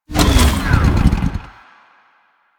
Dismount, Motorcycle; Motorcycle Ninety Nine

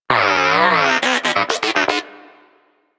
Moa Inquisitive Vocal Studious; Warframe

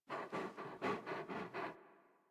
sit idle pant, Kubrow; Enemies; Warframe; Tame

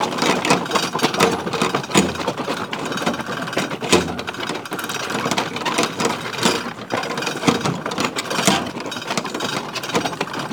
Chain Winch Spool Loop; Puzzles

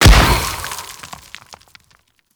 Tree Slam Explosion; Warframe; Teralyst; Abilities

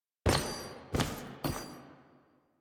Courtyard Intro Foley Step; Cinematics; Dax; Warframe; Duviri Quest

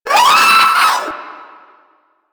Proto Infested vocal short attack

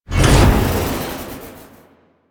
Army Cast Release; Warframe; Warframes; Hoplite

